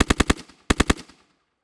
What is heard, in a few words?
Weapon Sub Machine Gun World War II Historical Double Burst; AdobeCC